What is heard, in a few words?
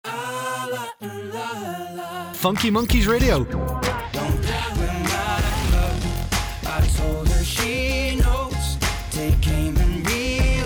Radio Music ID; Funky Monkeys Radio